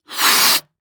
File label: Cartoon Balloon Inflate Fast Short; AdobeCC